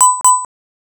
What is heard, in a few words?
double Beep